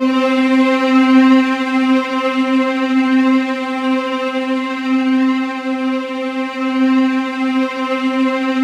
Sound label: C3, Roland JV 2080 TECHNO PAD